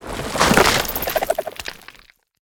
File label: Spider Trap Plant; Lurker; Evolve; Gorgon